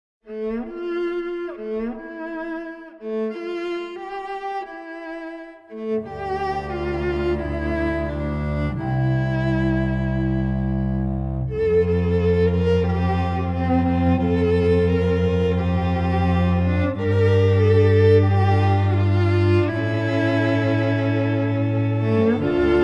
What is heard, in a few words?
String Quartet